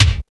KIK, Cheez Kiks; Club-50 - Foundations Roland

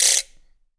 RATCHET; Big Fish Audio; Ross Garfield Drums VOL-2

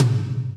TOM TOM, Roland

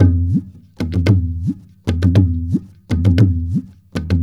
India, Heart of Asia

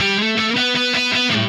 Happy Pub Guitar

